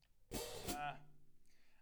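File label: SNR Top, snare top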